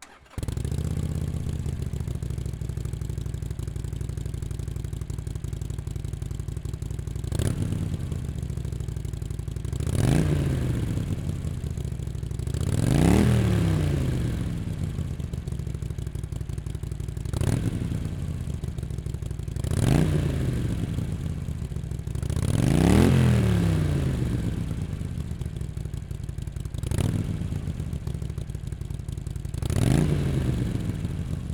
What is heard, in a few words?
Iron 883 Rev, slip-on mufflers for harley sportster; Exhaust Sound - Stock Rev; 2014-2018; Rinehart